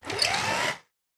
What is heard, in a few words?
Robot Servo; MatiasMacSD; THE MACHINES - ROBOTIC SOUNDS